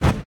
throw; shweets sim; braixen

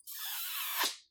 kiss; shweets sim; snivy

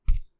hover; shweets sim

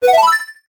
new Item; shweets sim; snivy

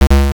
miss; shweets sim; snivy

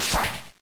kill; shweets sim; snivy

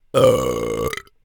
burp; shweets sim; braixen

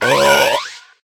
burp; shweets sim; snivy

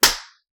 smack; shweets sim; braixen

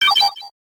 cheats Activated; shweets sim; braixen

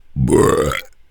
burp; shweets sim; braixen

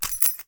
lock; calamity cobra 2

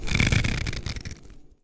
grow; calamity cobra 2

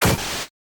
boom; shweets sim; snivy

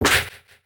pat; shweets sim; snivy

